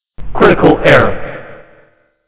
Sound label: Critical Error